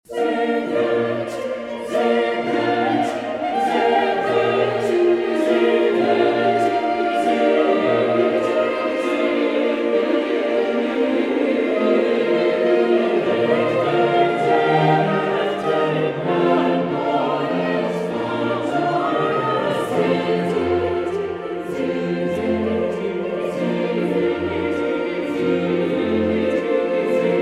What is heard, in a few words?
German Sample